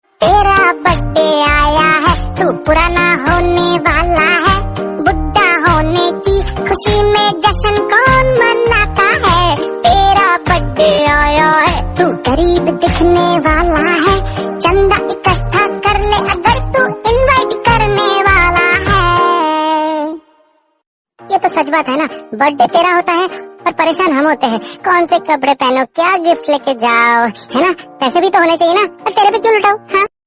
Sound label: Birthday funny Wish Hindi Song; Song Duration:-30 Sec